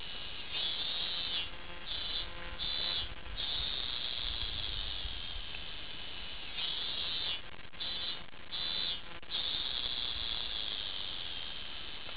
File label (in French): cigale